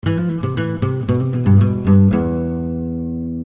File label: guitar dry